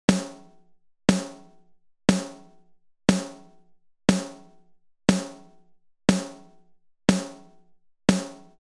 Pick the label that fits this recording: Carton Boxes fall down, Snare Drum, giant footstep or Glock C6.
Snare Drum